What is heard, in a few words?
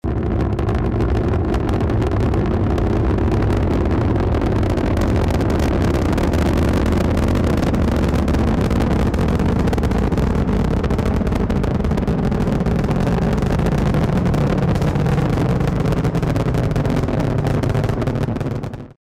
ringtone launch